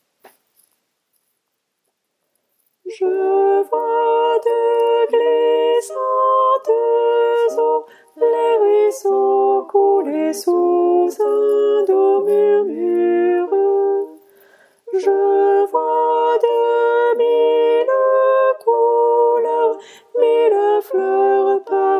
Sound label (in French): sopranes; Je vois des glissantes eaux